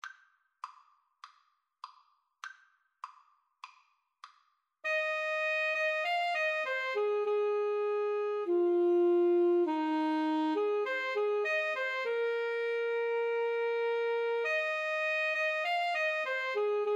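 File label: Alto Saxophone Duet, karaoke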